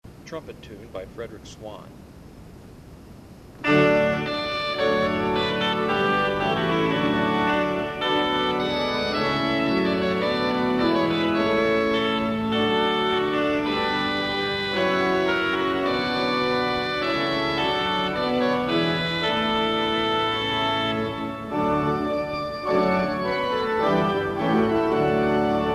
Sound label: Processional Recessional Trumpet Tune